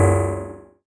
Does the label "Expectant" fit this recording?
No